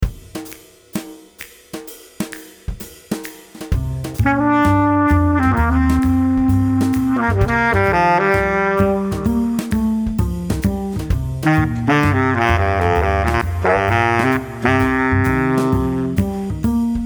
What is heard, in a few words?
Downright Upright